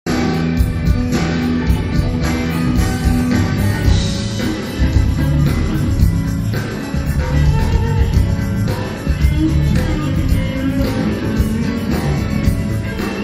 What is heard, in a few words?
Blues Jam